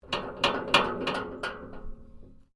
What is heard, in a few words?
MECHANICAL SUSPENSION METAL SPRING WOBBLE SHORT; Variou smechanical sounds; Objects